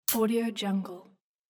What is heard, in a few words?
press